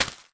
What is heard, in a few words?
Footstep Crayon Paper